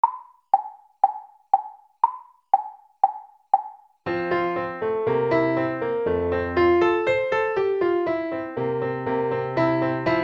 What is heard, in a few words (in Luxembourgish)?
Concerto fir Piano